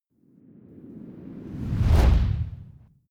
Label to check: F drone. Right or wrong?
Wrong